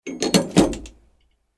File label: hang up the phone